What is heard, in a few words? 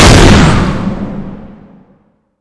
change fly, weapons